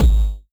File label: Kick, 8-Bit; Games And 8-Bit Kit Volume2; atomsplitteraudio; chiptune